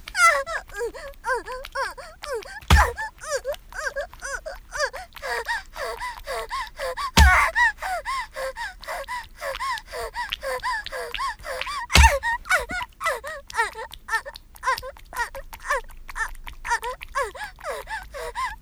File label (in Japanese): イク; 効果音あり; 単発 〆系